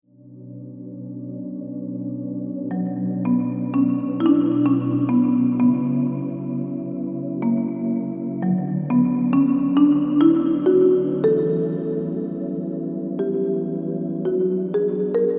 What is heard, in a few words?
Valse Melancolique